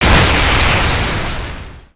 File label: Enemy Factory Active Hit